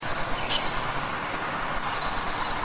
junco

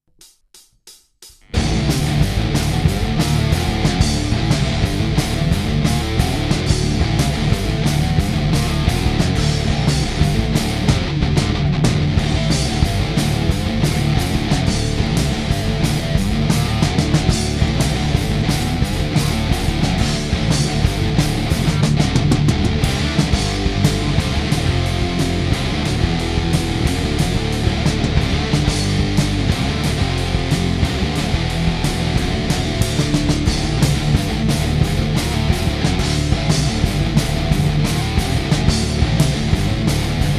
firing line no vox

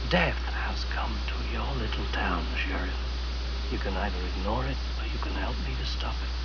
death; Halloween

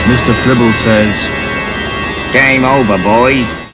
gameover

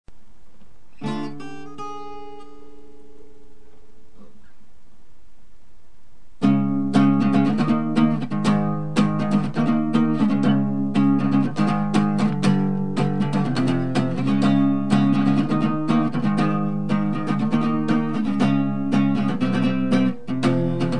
acoustic

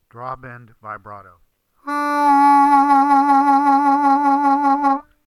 Draw Bend Vibrato

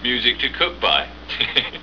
MUSIC

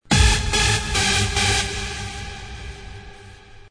synth

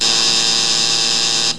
buzzer

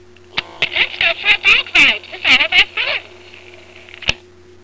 bike ride

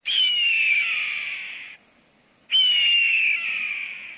hawk sound

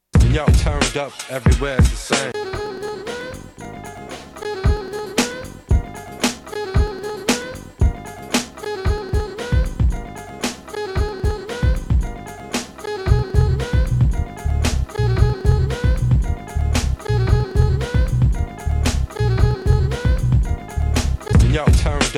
Boogie

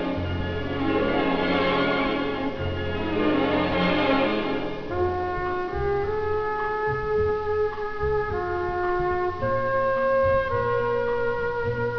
MOONLIGHT STRINGS; vinyl

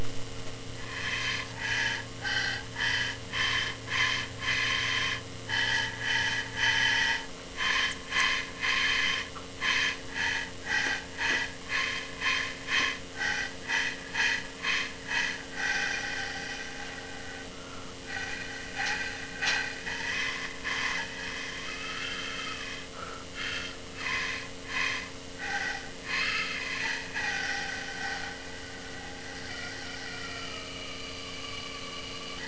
whistle

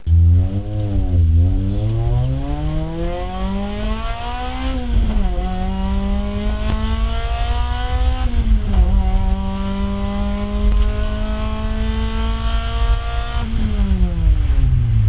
Silenced inside full acc, Exhaust

